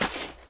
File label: grass step; Golden Crayon Sounds